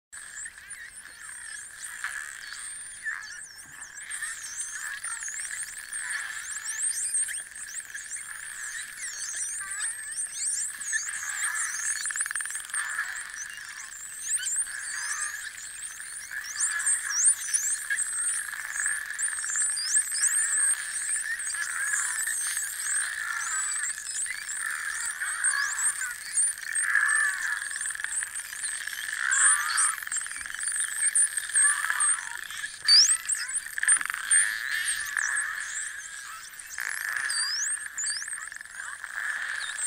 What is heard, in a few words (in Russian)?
zvuk delfina